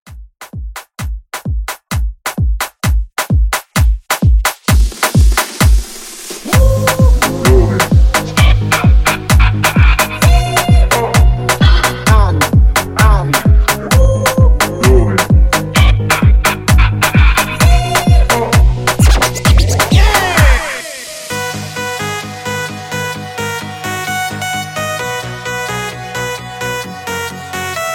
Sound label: Afro Dance Rework